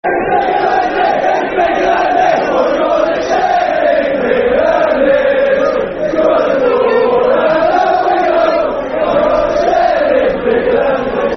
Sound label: My Garden Shed, Football Chants; Wolves; wolverhampton wanderers; fanchants